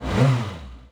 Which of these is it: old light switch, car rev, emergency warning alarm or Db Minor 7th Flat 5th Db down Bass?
car rev